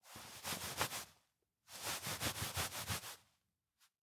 Shake; LOOPERS

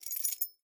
Key Holder; LOOPERS

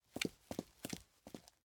Footsteps Pumps; LOOPERS

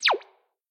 Send Botton; LOOPERS

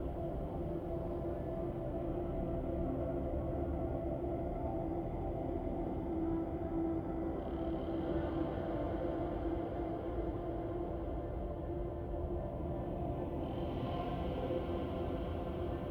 Wind; LOOPERS

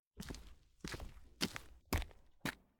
Walk; Tyler; LOOPERS

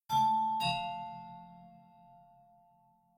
Intercom; LOOPERS

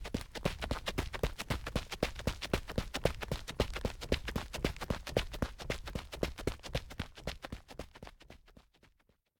Run; LOOPERS; Tyler

